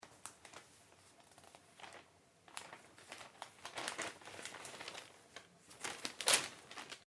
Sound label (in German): Ringordner durchblaettern